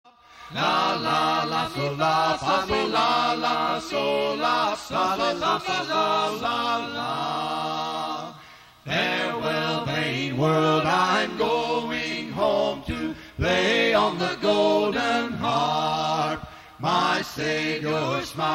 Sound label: Golden Harp